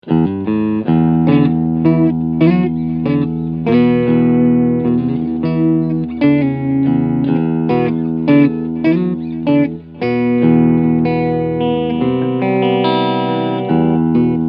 G&L Tribute Asat Classic Blues CAR Cleansound beide PUs